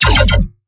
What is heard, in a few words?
Dirty Bass E 150, Bass Hits; One-Shots; Ghosthack - Color Bass Essentials